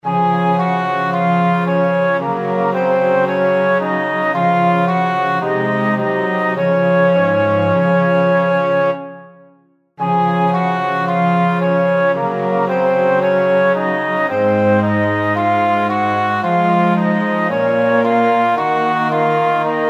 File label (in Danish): Instrumenter Db dur 3 stemmer 3 vers; Det er aften.